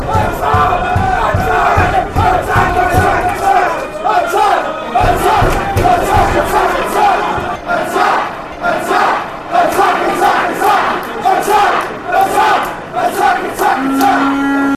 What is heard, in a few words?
attack attack, fanchants